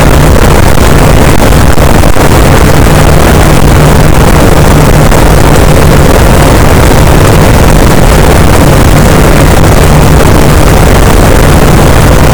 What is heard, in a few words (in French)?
cachalot positif, boat; Physeter Macrocephalus